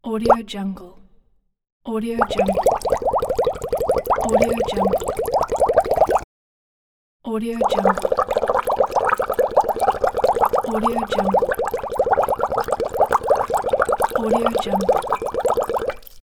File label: bubbles